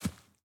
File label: grass, walking; factorio 2.0.55